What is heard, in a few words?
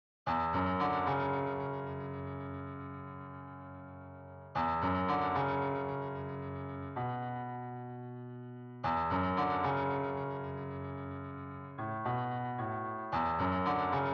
Strings